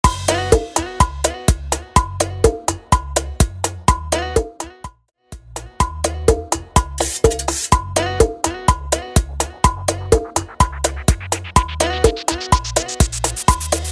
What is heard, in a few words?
Bachata Intro Outro Clean 125 BPM